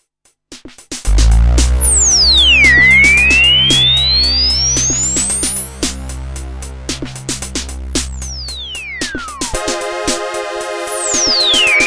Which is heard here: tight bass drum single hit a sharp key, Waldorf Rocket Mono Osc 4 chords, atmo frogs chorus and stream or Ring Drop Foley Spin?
Waldorf Rocket Mono Osc 4 chords